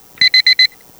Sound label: our beep